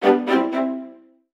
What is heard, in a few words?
classical